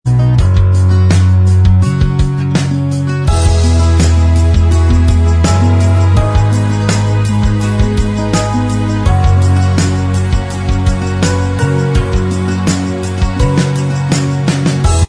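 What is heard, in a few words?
instrumental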